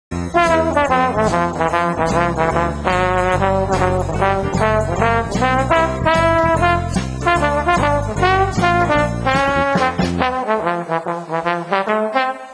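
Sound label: trombone solo stereo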